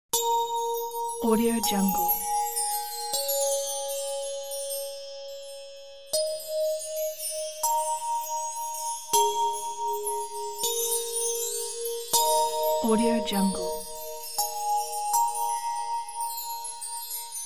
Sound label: christmas magic